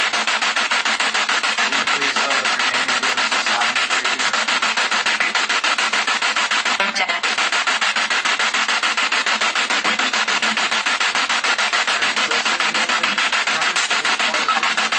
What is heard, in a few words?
Kitchen Spirit Box